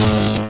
hit sparkle; Undercroft

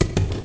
ladder; Undercroft

